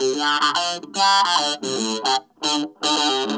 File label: T-TIME, Funk Guitar; 90 s Sample CDs; Zero G